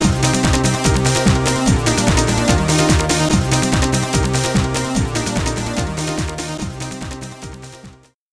Disco; Wreaking Ball

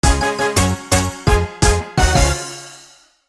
Minigame Victory; Mario Party 1

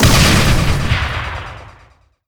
Boss Explosion; Pac-Man Championship Edition 2 Plus

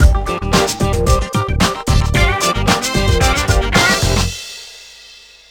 BGM Announce Standard Challenge; Foto Frenzy Spot the Difference 2009

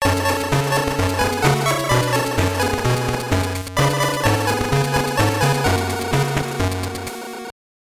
Jingle; Gremlins Unleashed; GBC

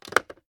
Phone Answer; Still Life; windows

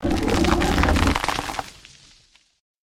Forest Giant Die; lethal company; ambience; windows

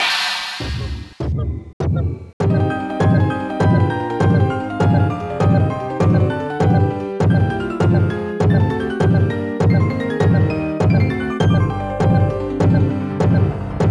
Heartbeat; EarthBound 64 Battle

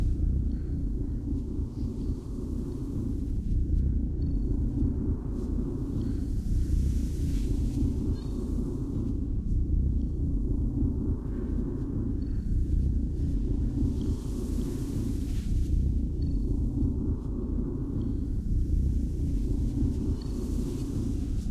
echoes; thief 2014 gamerip

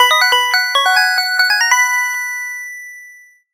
Clear SE; Kemono Friends Cellien May Cry; macos; windows; ParkmanClearSE

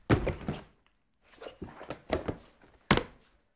hit body

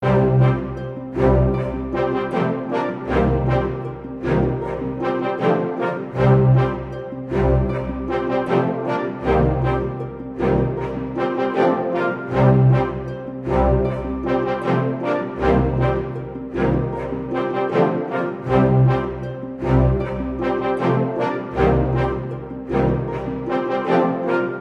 Scroll Post Jingle Loop

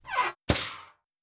Vent Open; Among Us

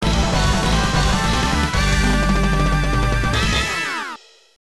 Winning; Ring of Destruction Slam Masters II; CP System II; arcade

